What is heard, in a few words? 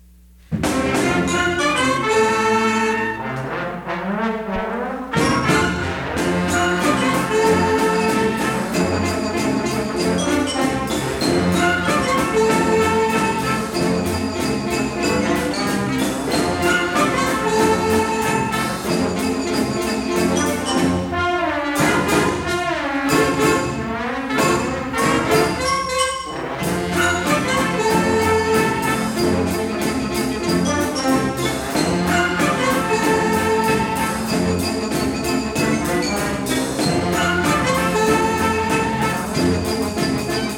middle school orchestra; hamilton middle school 1991 orchestra; lassus trombone